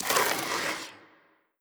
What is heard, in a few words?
zombie scratch, brickwall